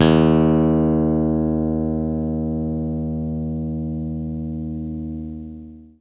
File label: Low E Flat, Guitar Tuner; Wintun16